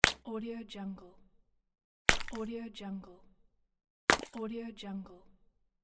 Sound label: bubblegum pop